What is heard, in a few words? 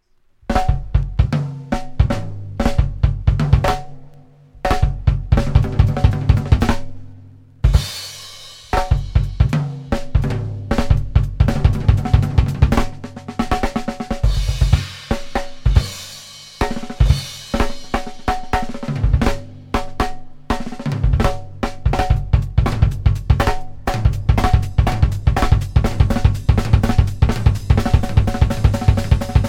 drum solo